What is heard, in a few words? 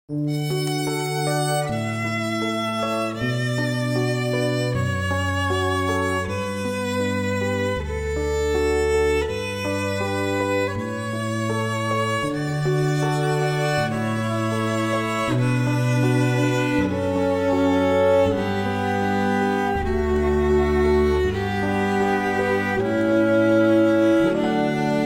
Palm Court Trio piano cello and violin